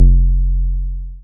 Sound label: Bass SH-101